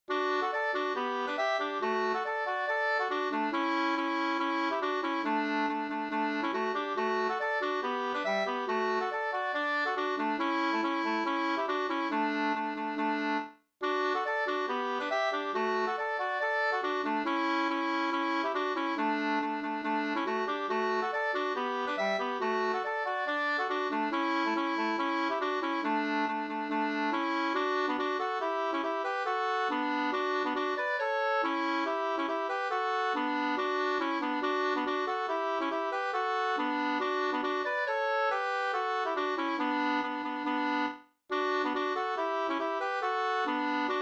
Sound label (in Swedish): schottis 4-4